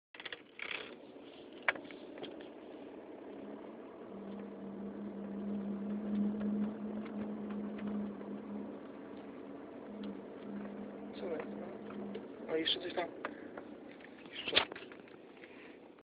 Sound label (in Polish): buczenie, buczenie przy skretach podczas jazdy; na słuchawkach od 5 do 9 sekundy najwyraźniej to słychać